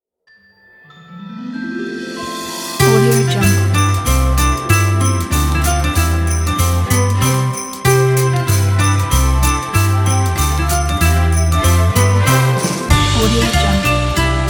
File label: deck the halls